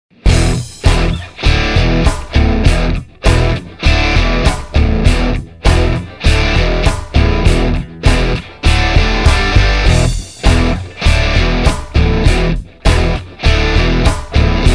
Crunch Plexi

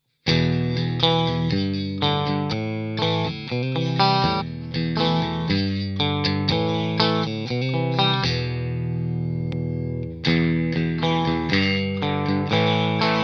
Stratocaster

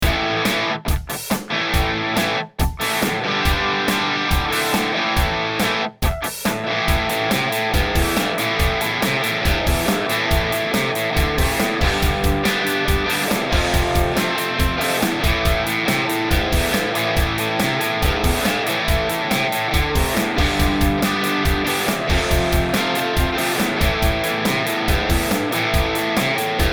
Telecaster; Mercury Box

